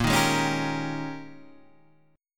G sharp Major 7th Suspended 2nd Suspended 4th, guitar; 6,6,6,6,8,6